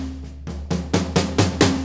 4-4 Fill, Drum Loops

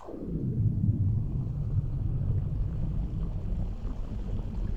Underwater Diving; E-MU Producer Series Vol. 3 – Hollywood Sound Effects

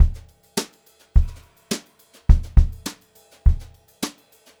104, Brushed Drum Loops; USB Soundscan vol.39; AKAI

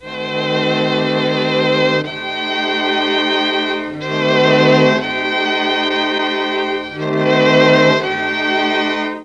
E4, Mellotron; Mike Pinder - Mellotron Archive CD

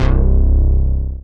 bass Gold C2; Best Service Gigapack 2

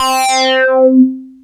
SYNCED, PROPHET, OLD SYNTHS; Rubber Chicken Software Sample Bank; SCI PROPHET

